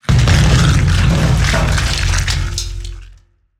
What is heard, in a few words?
collapse; soundscrate